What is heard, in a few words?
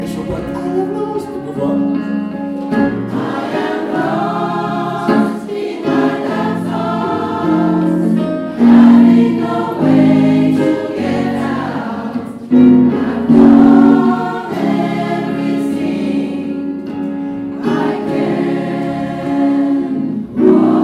help me find my way sop alt